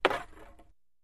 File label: Staff Hits Medium, spears & staffs